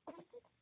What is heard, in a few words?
crying, baby cry